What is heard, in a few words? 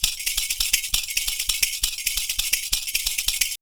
PERCU; Univers Sons; Raricussions